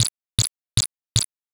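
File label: footsteps grass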